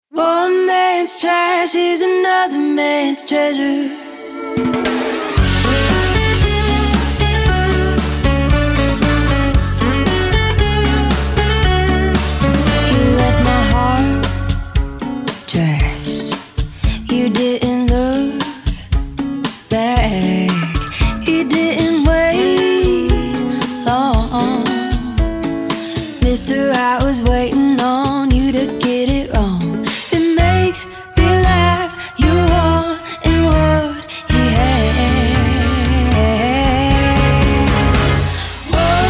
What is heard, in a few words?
Cha Cha 115bpm; UCWDC; partner competition